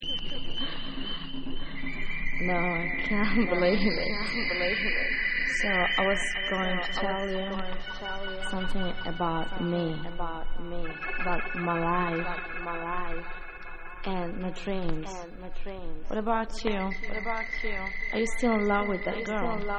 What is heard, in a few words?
Acappella